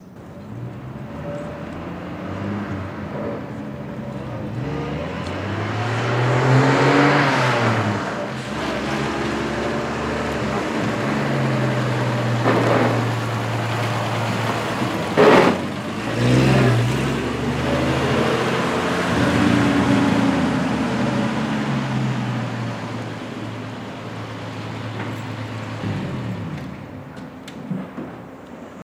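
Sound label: Chevy Truck 46 Long In Stop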